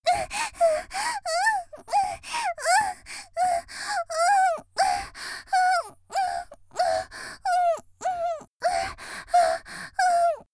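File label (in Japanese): 少女「喘ぎ」嬉しそうに; 元気な少女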